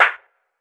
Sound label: clap, drums single hits; Claps